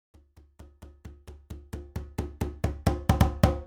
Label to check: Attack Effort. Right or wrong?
Wrong